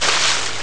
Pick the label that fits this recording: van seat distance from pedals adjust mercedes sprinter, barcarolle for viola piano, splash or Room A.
splash